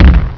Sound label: land, monsters; svencoop; quake1